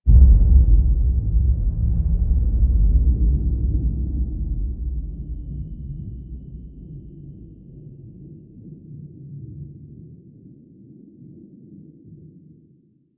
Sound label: Courtyard Intro Spiral Boom; Cinematics; Warframe; Duviri Quest